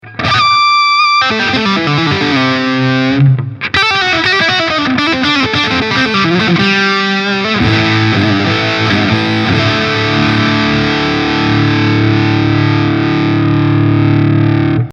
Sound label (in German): Steg; EVH Shark